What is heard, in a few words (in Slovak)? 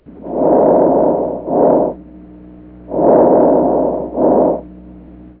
zostrene alveolarna; plice